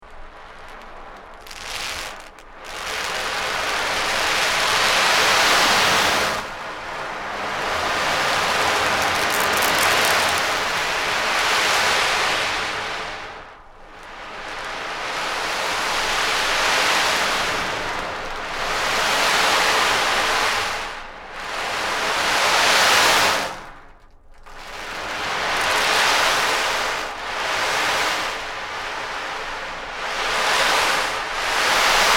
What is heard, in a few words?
Rain